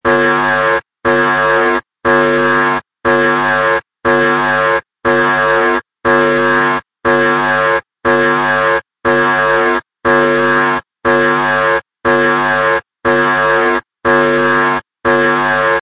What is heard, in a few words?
alarm 1 without reverberation; warn cameras and video recorders